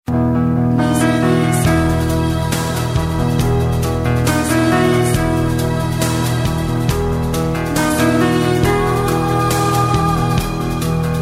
Hummin

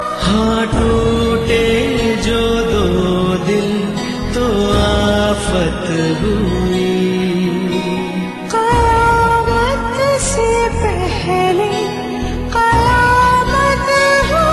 Sad Song

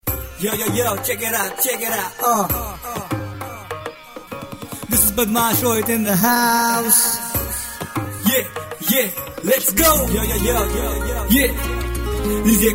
Dj Rap

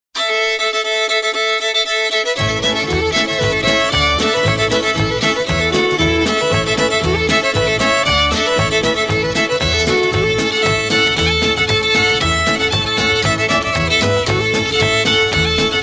Country

